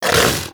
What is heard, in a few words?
Vocal Tranquillized Grunt; Hunting Critters; Vulpaphyla